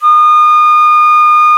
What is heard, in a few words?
D#5 FL, FLUTE; AKAI S-Series CD-ROM Sound Library VOL-7